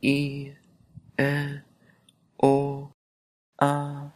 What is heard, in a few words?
vowels